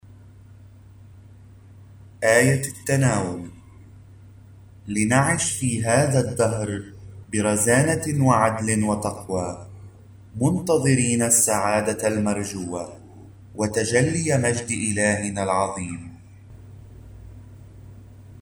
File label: Communion Antiphon; Thursday of Advent